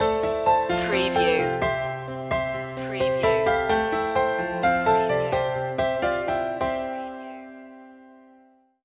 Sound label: cheerful inspiration stinger